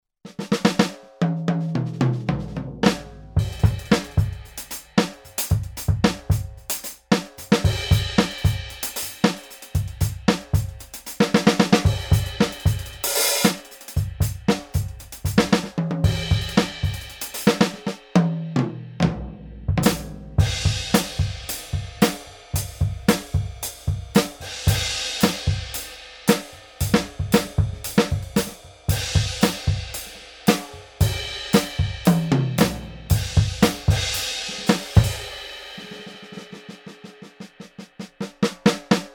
ATV a5D Kit Second Line; Steven Wilson Kit

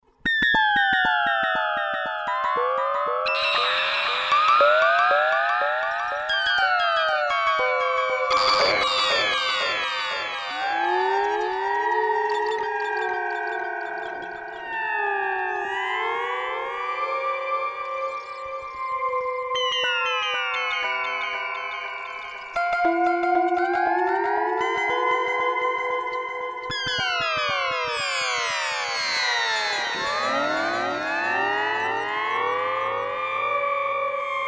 Meris Hedra

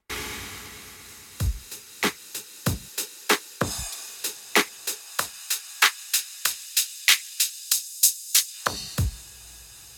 KP3+ freeze Rev

